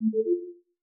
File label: notify